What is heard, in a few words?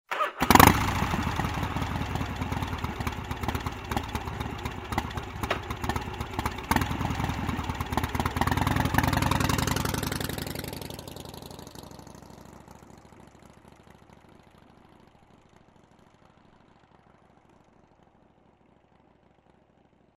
Harley-Davidson motorcycle starts, waits, drives away